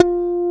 Hrm Flan F 2, GUIT2 AC; Best Service Gigapack 2

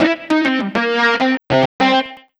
Metafunk, Leads And Riffs; Sonic Foundry (Sony Creative Software); Synchro Funk

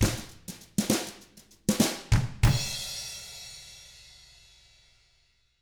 FUNKY, Drums

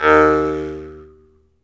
jaw harp; E-MU Producer Series Vol. 7 – Old World Instruments

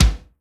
bass drum, Drum & Bass, 160 BPM; Ueberschall; Drum & Bass Flash Trackz

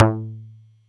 Pizzicato a1, Yamaha YX81Z

